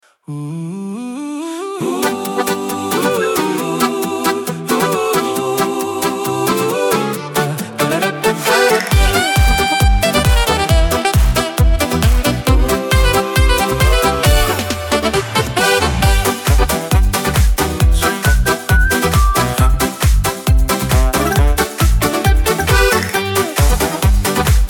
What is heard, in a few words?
disco sax; Aranżacja autorska; Disco sax 012; craftstudio24